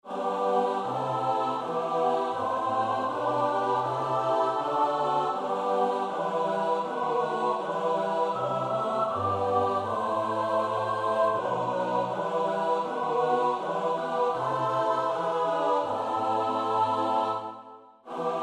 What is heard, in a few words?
S-A-T-B; Reger (Max); 7 geistliche Volkslieder